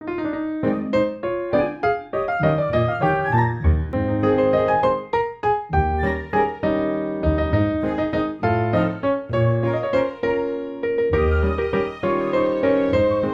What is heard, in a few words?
Gameplay; Everybody 1-2-Switch!